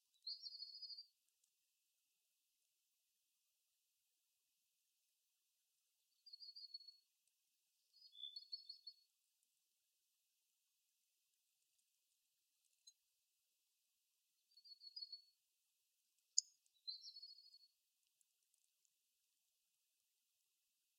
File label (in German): Lichtung Soundaufnahme Botanischer Garten Marburg